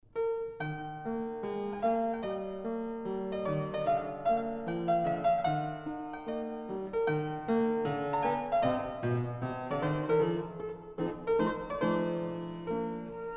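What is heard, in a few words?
clarinet trio; eberl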